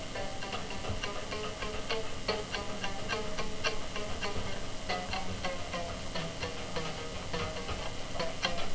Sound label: banjo